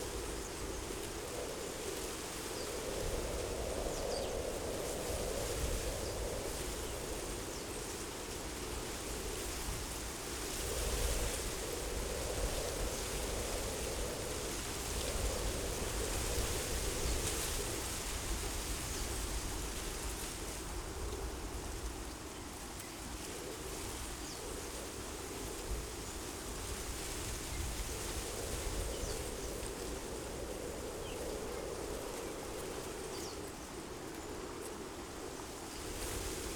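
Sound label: ambience painting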